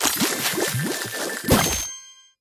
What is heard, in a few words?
Fish Swim Wrapped; Candy Crush Soda